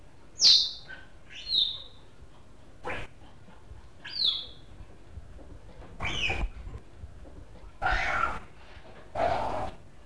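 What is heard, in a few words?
Acinonyx jubatus juvenile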